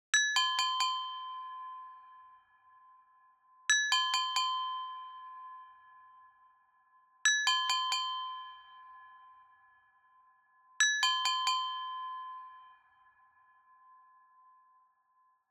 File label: Agogo Bells